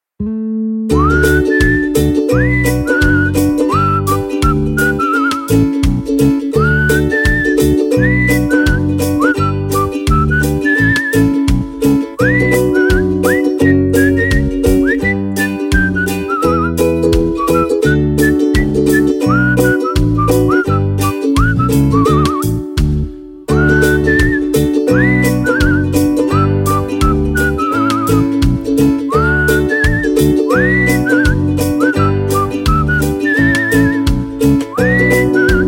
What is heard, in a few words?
Whistle & Ukulele